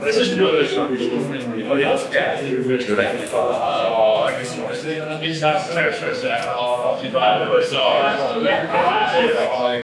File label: faint murmurs of artists